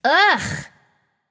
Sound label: "ugh"; smite; voicepack; nike